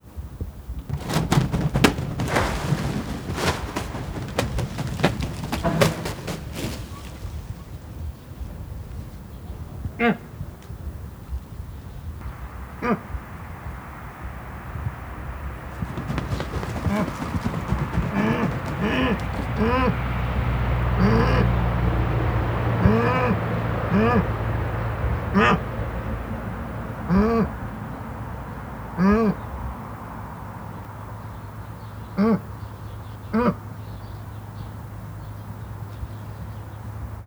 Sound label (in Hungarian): szárnycsapások szélvédő, pusztai sas; állathangok; nyíregyházi zoo 2010